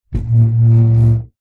furniture chair dining metal framed slide wood floor med rub